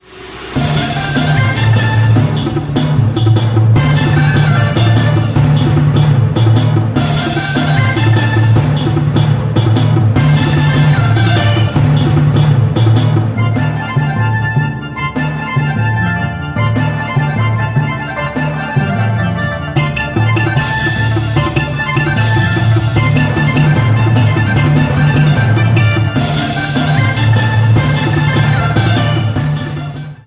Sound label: Chinese Orchestra Composition